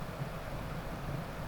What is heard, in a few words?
Boat